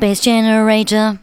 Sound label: VOCALS SUNG; Techno Trance Essentials